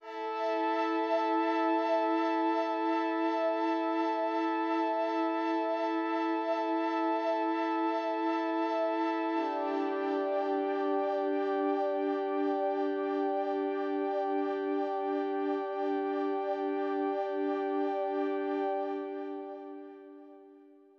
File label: Pads